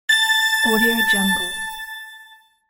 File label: magic hit; archisound